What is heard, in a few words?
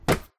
Hit; HuggyWugg